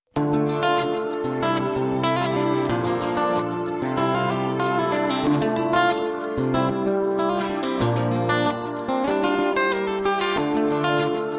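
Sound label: Crazy Guitar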